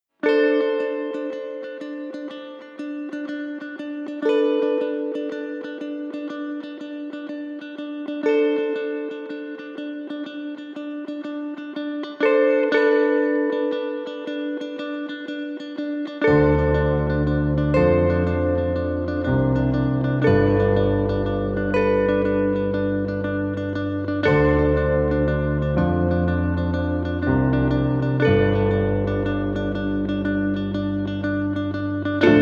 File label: Sad Mystical Sci-Fi